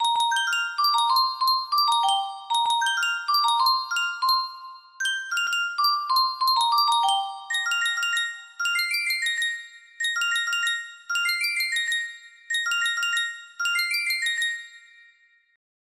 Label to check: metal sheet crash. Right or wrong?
Wrong